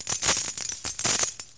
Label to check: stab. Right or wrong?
Wrong